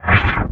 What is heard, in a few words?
Menu Horror